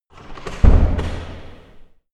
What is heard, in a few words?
closing door in big room